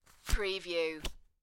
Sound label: Cards Playing Cards Dealing